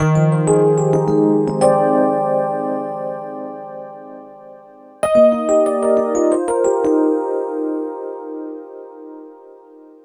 EP, Keyboards, Electric Pianos; Sonic Foundry (Sony Creative Software); Mac Money

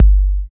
KICK 2; East West - Phat & Phunky CD2; 90's Sample CDs

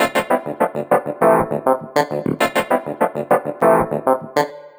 phunk keys; Sonic Foundry (Sony Creative Software); Ugly Remnants VOL-2

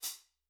Soft Fluff, Hats; Airwindows

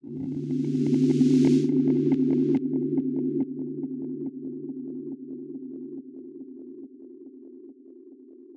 fx spoopy

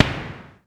rim, Tama TS-305

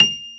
STACK PIANO; AKAI S6000 CD-ROM - Volume 1

